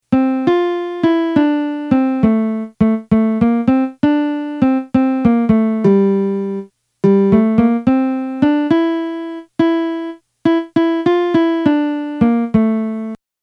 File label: melody